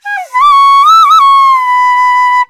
VENU, India Winds; E-MU Producer Series Vol. 7 – Old World Instruments; World Combos

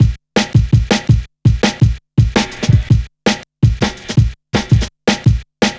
Check A, Jungle, Drum Loops 165.5

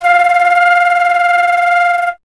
flute F; Miroslav Vitous - Solo Instruments VOL-2; Solo lnst. II

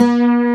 atmosphere C; Greytsounds; Scott Peers Sound Engineering

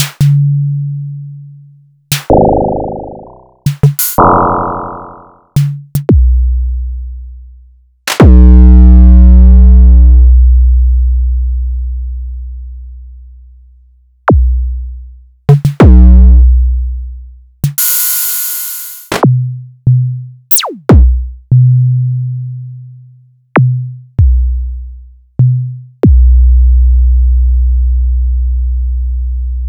Sonic Core EDS16; m8

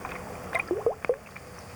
Cove Cave Dark, Water

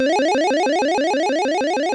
synth, amiga med

